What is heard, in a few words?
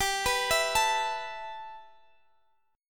G 6th Add 9th G, Guitar Open F; arpeggiated; x,x,19,18,19,16